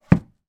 foley wellington boot put down on carpeted wooden floor